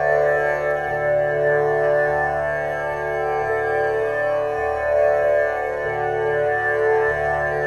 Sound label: ethno, 125, A